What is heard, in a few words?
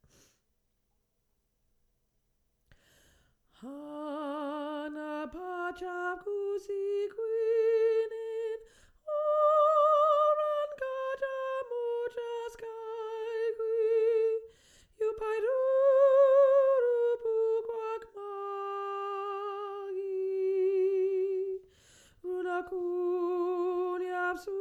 hanacpachap sop